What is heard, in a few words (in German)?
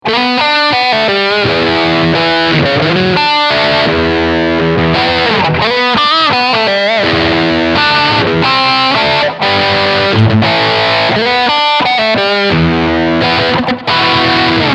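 SC Mitte Steg Overdrive Lic, guitar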